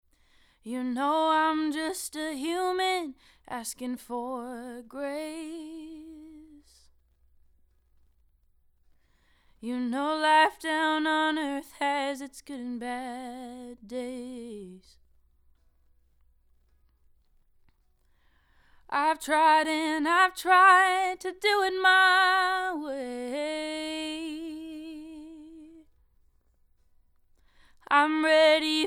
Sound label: Solo Slate 251